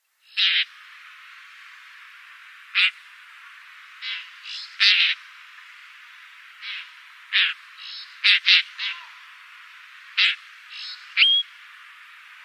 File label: Blackstart calls